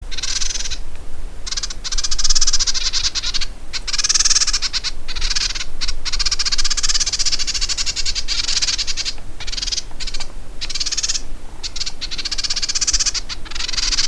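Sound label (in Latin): baeolophus wollweberi, Sikory; Sikora cuglowa; ptaki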